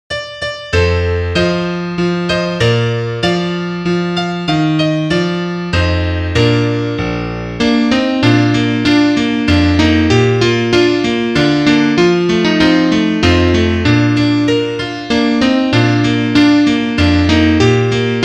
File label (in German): Klavier; der mai ist gekommen